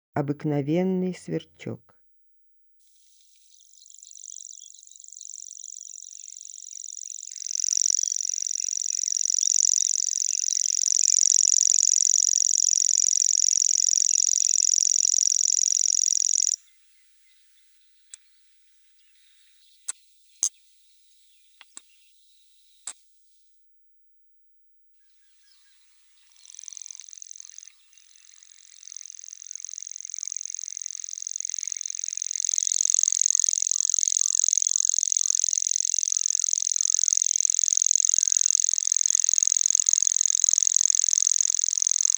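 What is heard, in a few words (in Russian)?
obyknovennyy sverchok golos, grasshopper warbler voice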